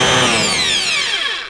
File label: speed down